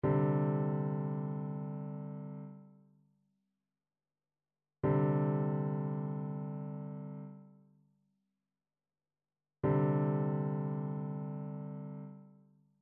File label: trichord; trichord identification